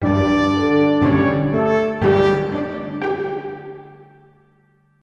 Orchestral Logo

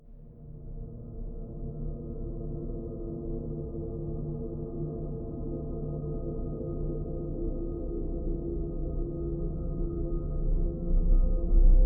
Creepy Ambient Music

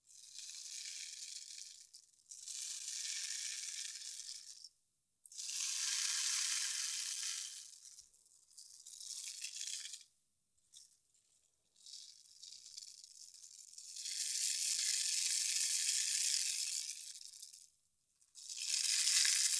Rain Stick Slow

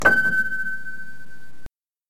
Piano

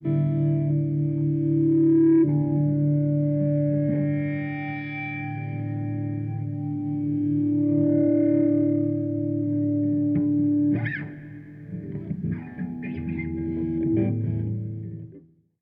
Messy Feedback Swells

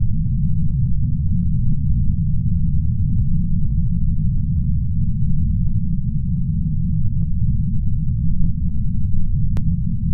Horror cinematic dark horror room tone

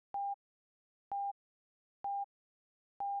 Beeps For Heart Monitor

